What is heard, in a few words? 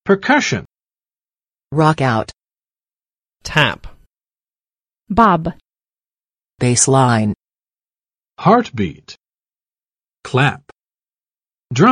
percussion; The Power of Percussion 敲敲打打乐; 【音】敲打乐器